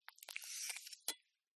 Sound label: CLEAN KNIFE PULL OUT FROM FLESH; PMSFX